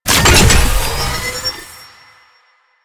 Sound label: Research Pod Start; JadeShadows; Ascension